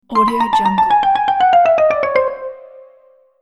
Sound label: cartoon keep falling